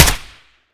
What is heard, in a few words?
fire 762 sup, mk23; ARC9 uplp fesiug